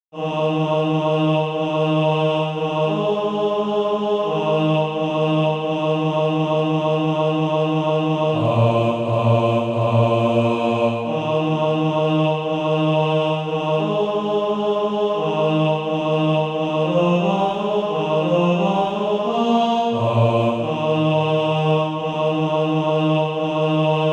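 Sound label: bass, Practise Music; SATB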